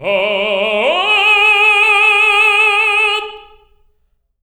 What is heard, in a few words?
TENOR OCT G#3